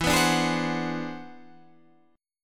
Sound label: E 7th Flat 13th; E7b13; strummed